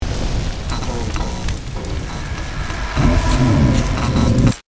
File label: morse